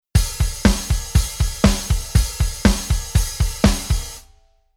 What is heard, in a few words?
Rhythm